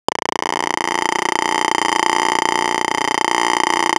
Bass drone - A#, Circuitbend; Drones - Bass; 99Sounds; Mangling Audio

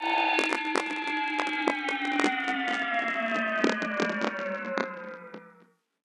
Movement; 99Sounds; InterSpace

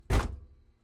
Mazda RX7 Door Close Interior; DPA4021; 1990; Pole Position

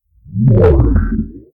time stop; FXHome

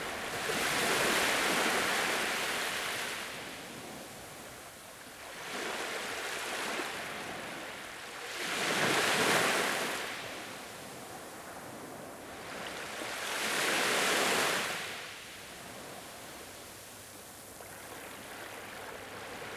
Ocean Waves Gentle Sand; justsoundeffects

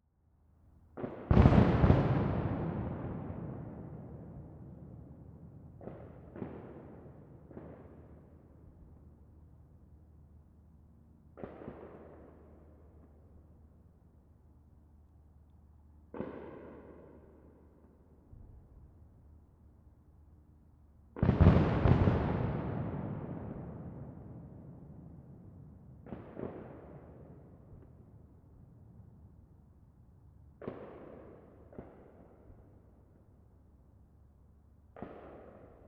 FIREWORKS; Neumann KMR81+SD702+DR40; Bombay Sonic

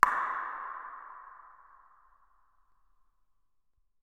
Mouth Click Reverb; 99Sounds; The Warehouse

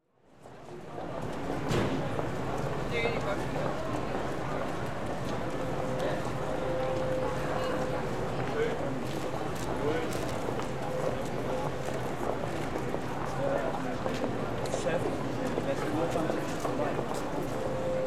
Germany, Pedestrian Precinct; European Soundscapes; BBC Sound Effects Library

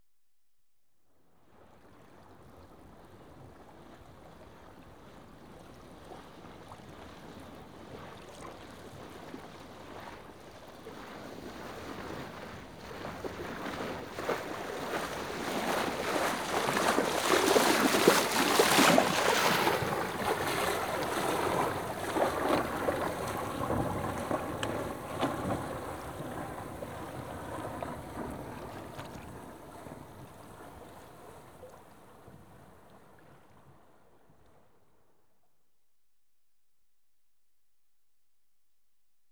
Sailing Dinghy, Sail Past Left To; Ships And Boats; BBC Sound Effects Library; Ships And Boats 2

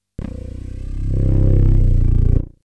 Aliens Monsters; FXHome

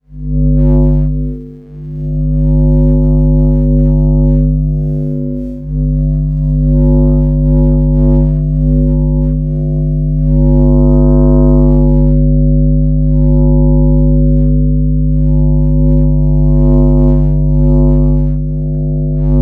Electro Magnetic Fields - Hums Bass; Systematic-Sound; Sound Themes – Electro Magnetic 01

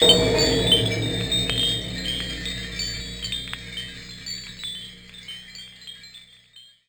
Cimpact sound, Industrial Samples and Impacts; Bluezone; Cinematic Session Industrial Samples and Impacts

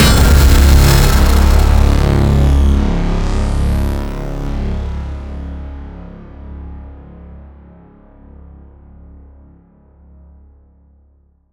whoosh impact hit; Bluezone; Cinematic Impact Sound Effects

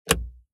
signal lever on, Car Interiors; Sounds Great

